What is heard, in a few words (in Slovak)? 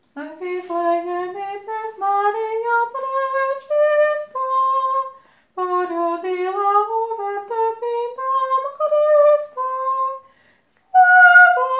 vviflejemdnesmariasopran